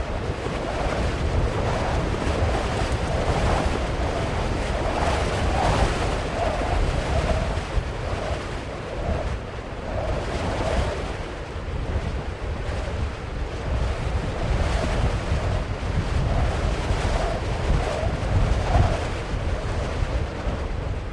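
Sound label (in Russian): зима порывистый зимний ветер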